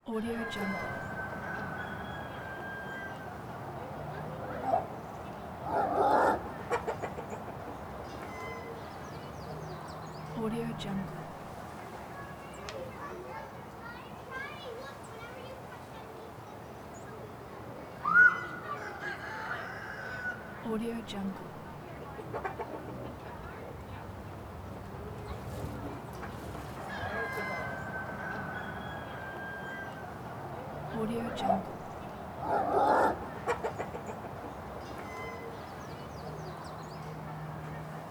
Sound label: farm background